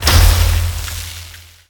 shockwave

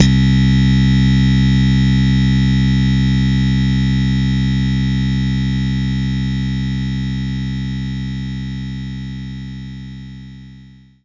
slap bass C2

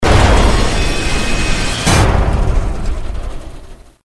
train derail

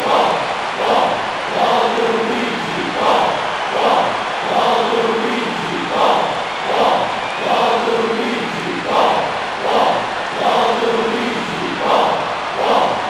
Crowd Song; Waluigi's Crowd Song; mario strikers charged gamerip

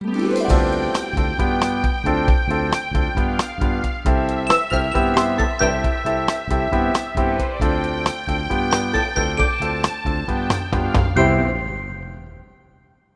Bari Sax

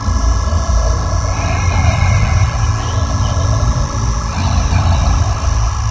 Pickups Powerups; unreal development kit

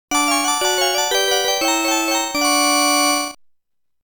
Game Over Music

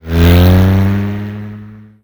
Car Passby Accel

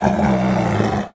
growl

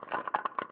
zombie fungus prepare; Hollow Knight

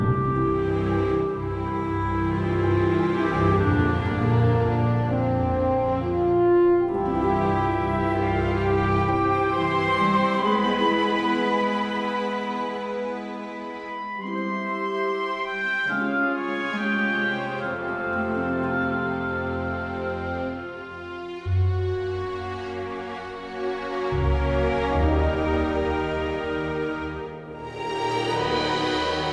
Strategic Grassy Area Loop; Fort Triumph

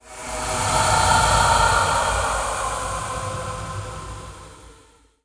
Ghost In Out; Dark Dimensions: City of Fog

